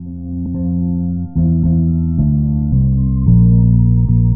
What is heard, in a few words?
Bass, Trip-Hop Loops; Sonic Foundry (Sony Creative Software) - Rondo Brothers Trip-Hop Loops & Samples; Apologists